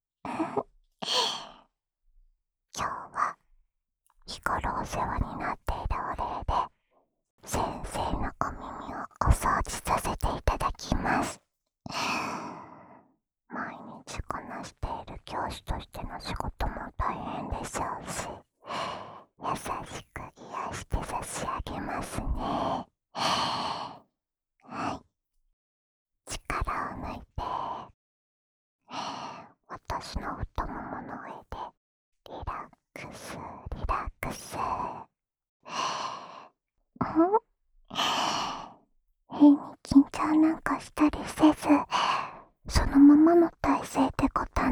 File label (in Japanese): 安眠用 耳かき ループ（左耳）